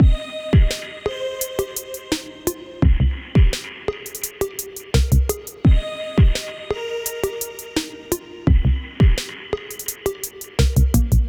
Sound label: Pluck Intro; BB 2HTC Samples Vol 4